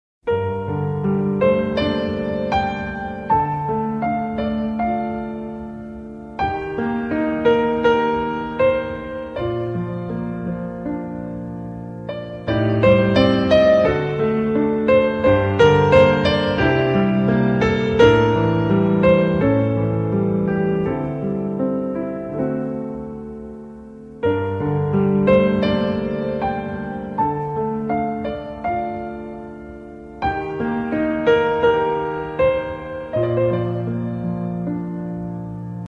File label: piano